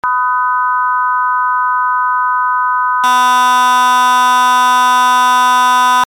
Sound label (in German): Major Chord Anregung